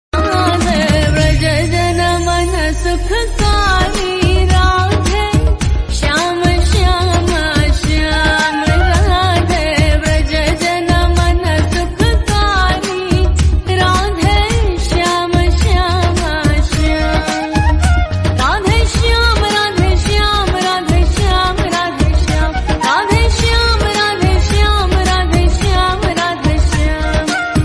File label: Bhajan Ringtone